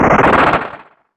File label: golem activate; More Creatures